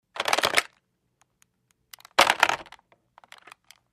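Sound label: Telephone Pick Up Hang Up Light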